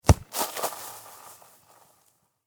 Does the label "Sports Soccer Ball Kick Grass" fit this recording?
Yes